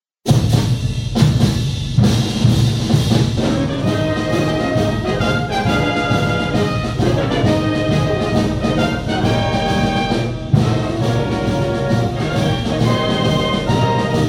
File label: drumroll Reverb